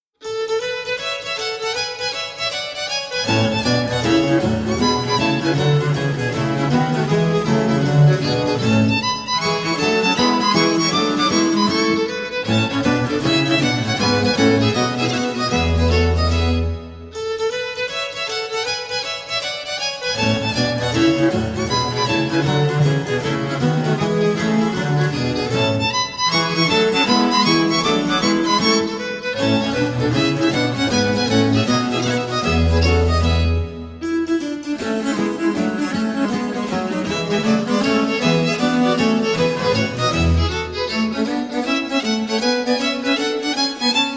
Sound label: Gigue (Presto), spoken; Mensa sonora Pars IV (C 72); Simplicissimus Ensemble